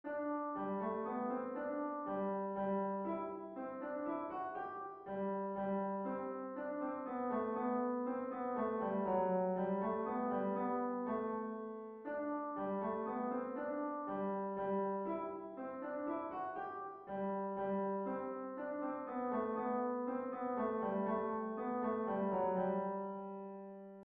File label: Bach Minuet in G, piano; level1; easy